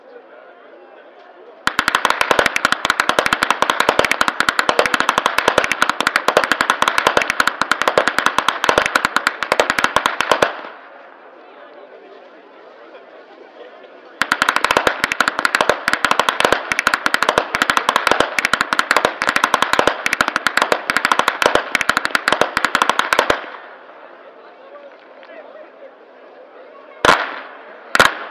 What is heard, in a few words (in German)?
schnalzen, flachgau